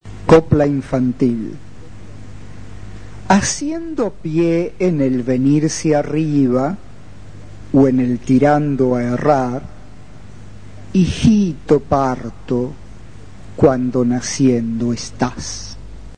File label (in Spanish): Copla infantil